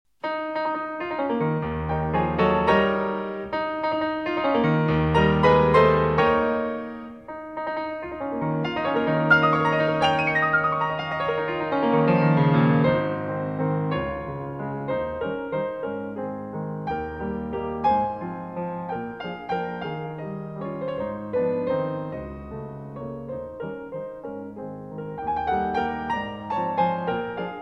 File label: Waltzes in A-Flat Major